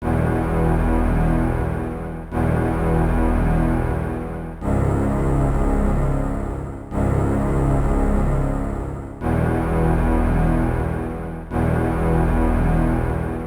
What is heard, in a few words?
wind music; It came from the Desert